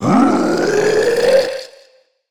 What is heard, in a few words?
Bowser Growl; Odyssey